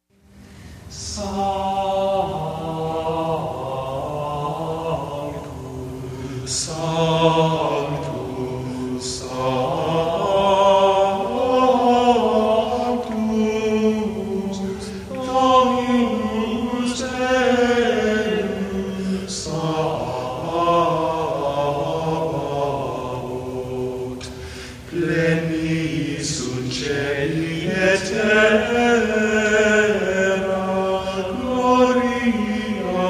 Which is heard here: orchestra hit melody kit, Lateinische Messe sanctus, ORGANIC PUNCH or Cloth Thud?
Lateinische Messe sanctus